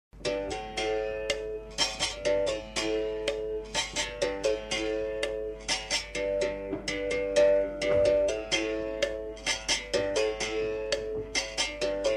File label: Berimbao